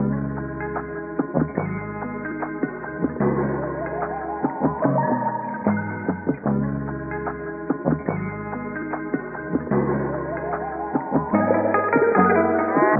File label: keys voice strange and haunting, phonky loops